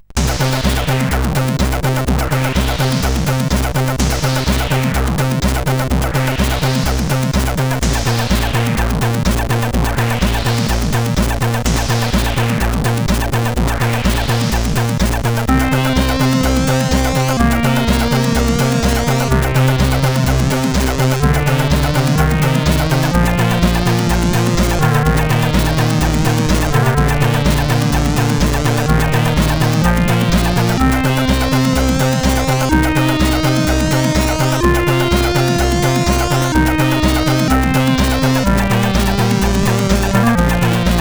Newjack Swing, sid CSG8580R5

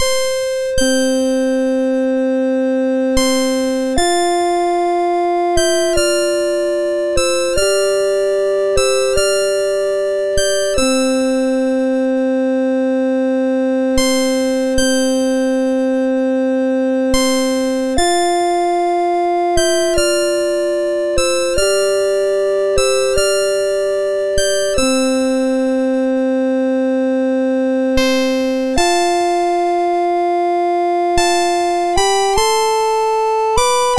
Lullabye, MOS6581R2

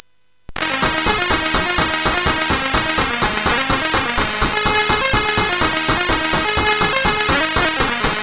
sid CSG8580R5, GAMES; Aussie Games

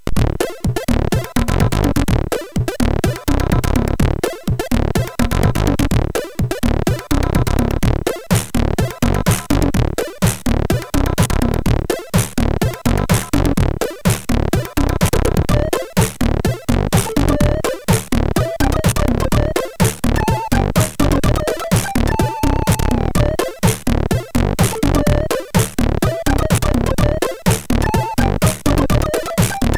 tune, sid MOS6581R3

